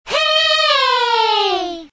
children joy

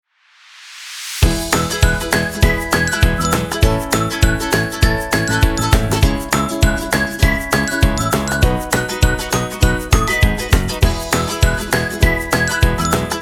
music kids happy

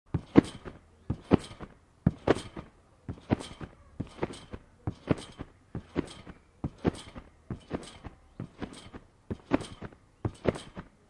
jumping on a trampoline

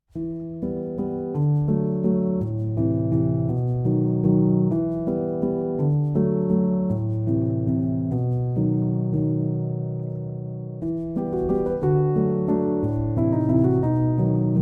sonata piano music

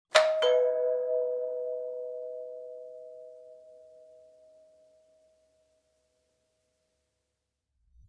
classic doorbell chime

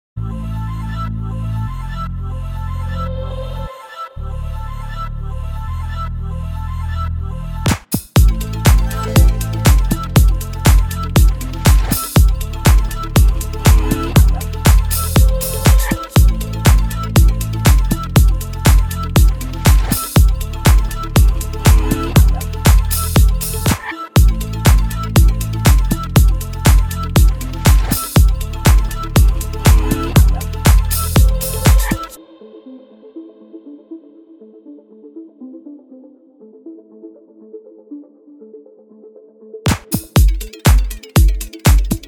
song for wild extreme holidays voyage jeep

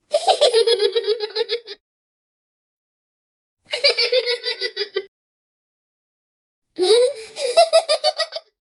giggles of children

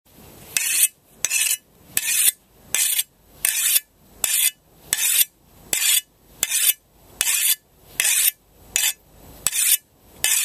knife sharpening

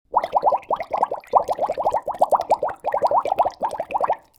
water boiling water

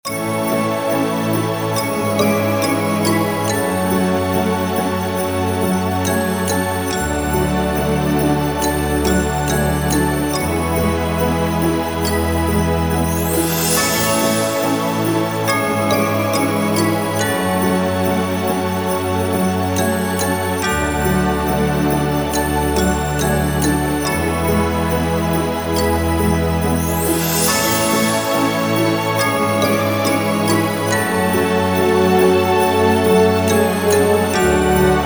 music celebration christmas

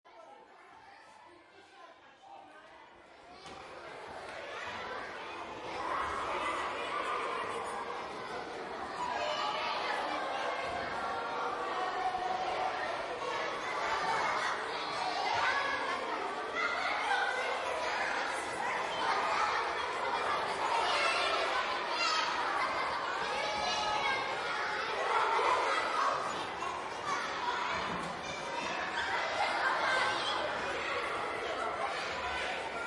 children in school on break

